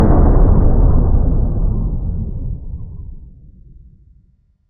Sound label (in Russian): za gorizontom udar i eho, zvuki meteorita asteroida